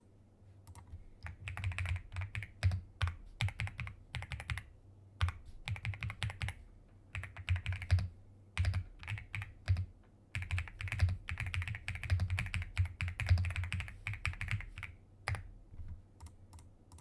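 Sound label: keyboard; rapoo v700diy 98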